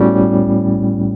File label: E.PIANO, KEYS